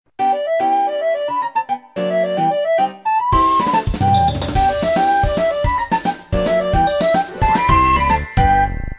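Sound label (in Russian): забавное смс забавная мелодия смс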